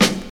00s studio acoustic snare g key, snare drum sample tuned to the G note; snare drum samples